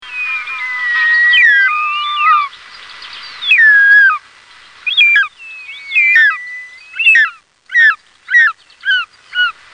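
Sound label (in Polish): gadożery, głosy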